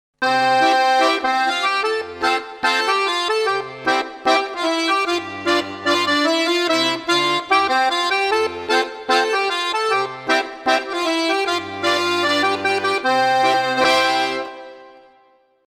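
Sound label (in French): Fete au village Jingle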